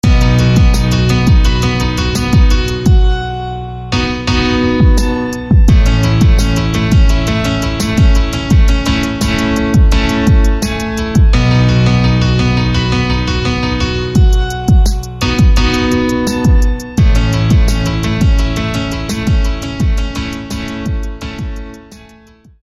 Keys; Aueffen; SFB FX

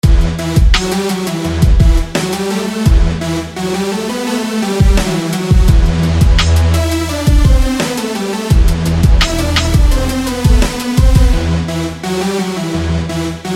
Synth; JMic; SFB FX

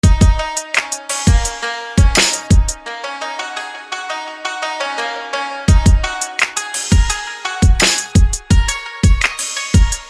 Shamisen; SFB FX

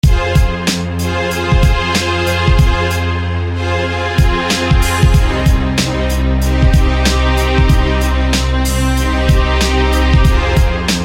Black Strings; SFB FX

